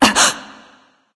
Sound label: surprise; freya